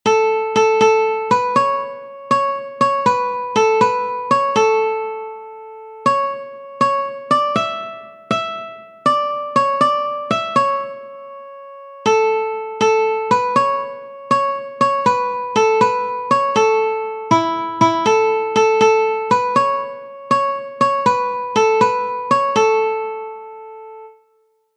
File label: Itsy Bitsy Spider Guitar One String